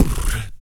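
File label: vox percussion, Vocal Planet Jazz FX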